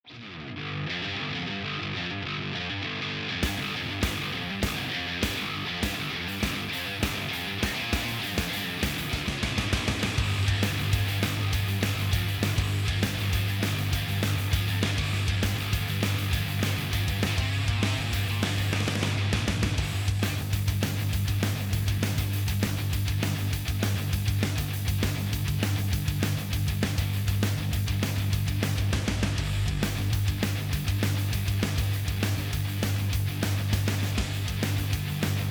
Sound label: Instrumental loop; Caffeine; rwby grimm eclipse